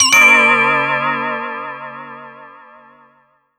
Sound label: spell tick set; Garry's Mod; tf2